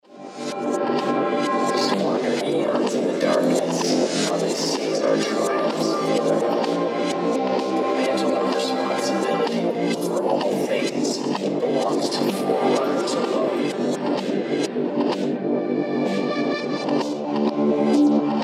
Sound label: soundtrack cryptic whisper message; halo